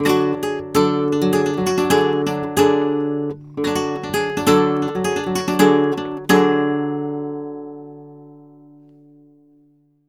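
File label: arpeggio guitar, Flamenco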